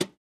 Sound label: reel Stop; secrets of christmas